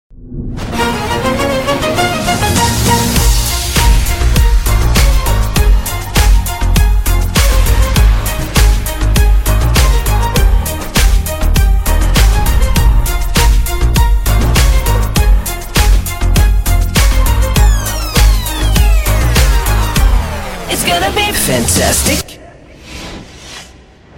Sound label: Violin Bass